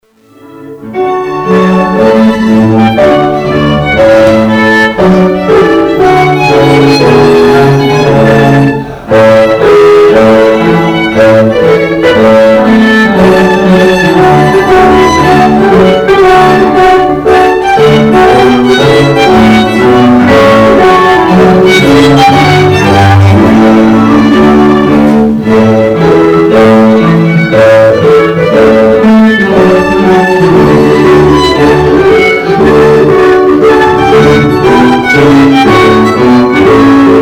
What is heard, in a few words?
piano trio trumpet